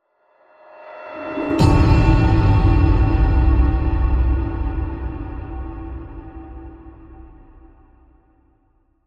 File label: Chinese Chappas Whoosh Hit